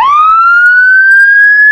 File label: manual, sirens; whelen std